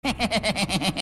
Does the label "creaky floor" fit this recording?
No